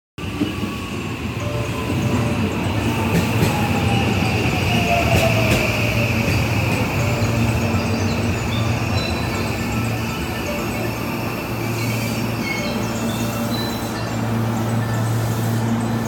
大阪環状線接近、野田駅で録音, train sounds

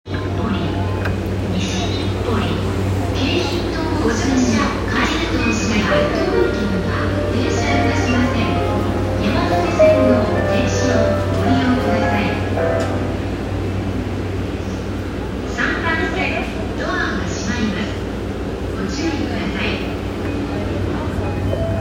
JR山手線西日暮里, train sounds

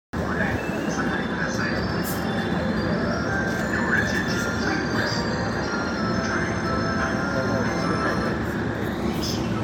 京浜東北線与野南, train sounds

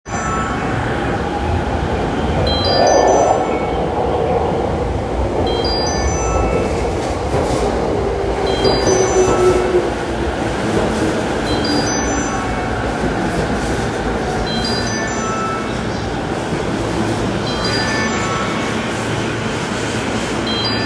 JR中央・総武線市ヶ谷西接近, train sounds

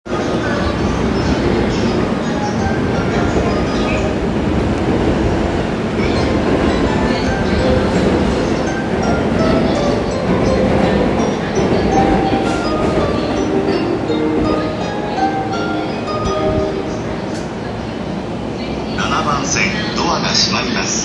JR常磐線東京北

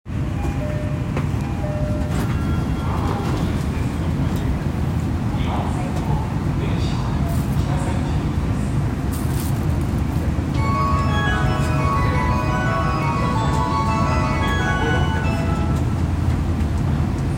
日比谷線虎ノ門ヒルズ北, train sounds

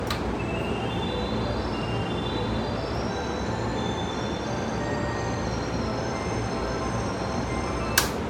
JR京浜東北線洋光台南, train sounds

